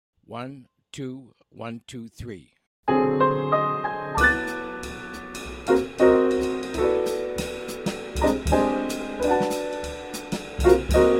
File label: minus bass